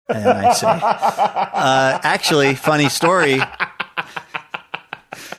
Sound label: having good laugh